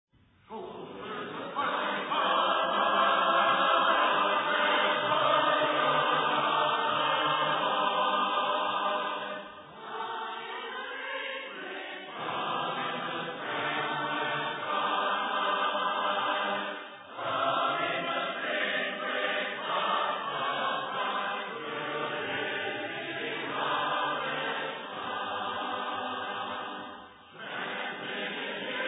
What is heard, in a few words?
a capella hymn